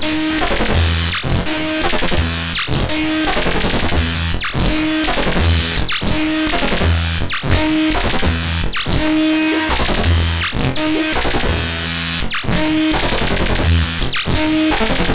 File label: fourses seq